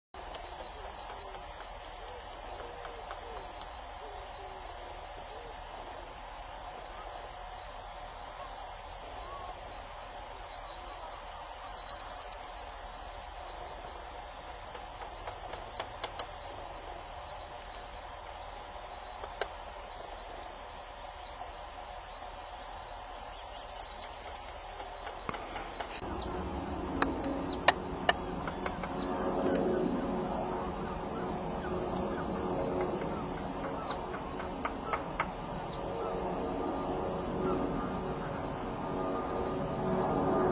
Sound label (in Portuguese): canto da escrevedeira amarela